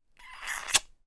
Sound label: human kissing